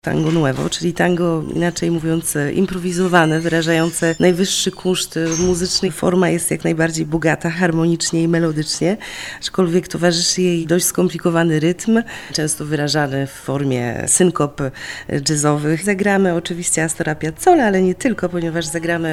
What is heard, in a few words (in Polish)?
news tango